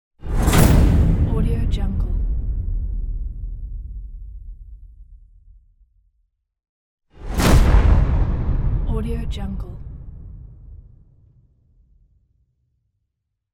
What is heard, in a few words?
cinematic trailer sound effects